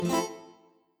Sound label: Instr Guitar; Backpack Hero